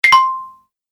Message Notification; High Tech

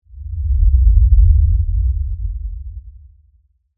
LFE Impact Tremor; Production Element, Imaging Element, Accent, Transition; Low Impact Sound Effects

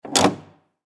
Semi Truck Mechanical Air Vent Open; Trucks; Industry; Blastwave

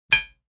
Ting, Metallic; Electronic

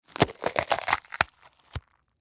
Watermelon Tear Open Rip Juicy Fruit; Food; Foley; Household; Blastwave

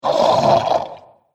Troll Laughing Growl, Monster Sound Effects; horror

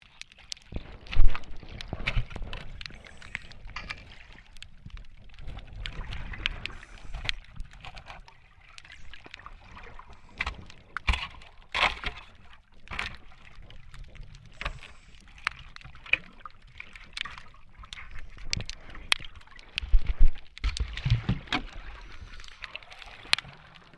MAINTAINENCE WORK UNDERWATER SHOVEL SCRAPING

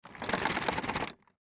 Box Shake Hard, Box Shake Hard Package; Shaking; Objects

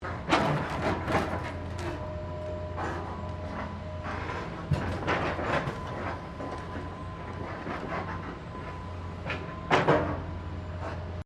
Construction mechanical claw movement

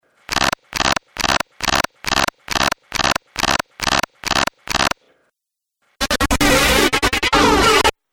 Dubstep Scifi Effects

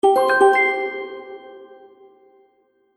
Magic Purchase Ding; Cartoon Sound Design

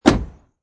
Car door is shut; Door Closing; Sound Effects:Doors

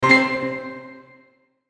Hit Stab Piano Pad; Stabs; Blastwave

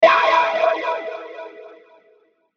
Hit Burst Wah, Hit Burst Wah Pulse; Production Element Imaging Element Accent Transition; Bursts; Blastwave

